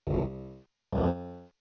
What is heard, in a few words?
violoncello